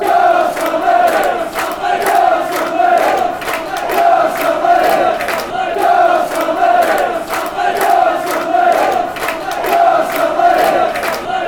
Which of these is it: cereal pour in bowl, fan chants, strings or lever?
fan chants